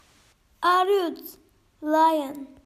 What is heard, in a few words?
Lion